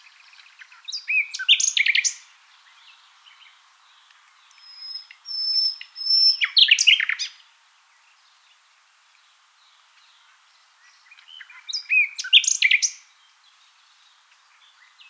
call, Nightingale, full song, adult male, Note the beautiful variety of this song. Background: Nightingale song and Turtle Dove call.; luscinia megarhynchos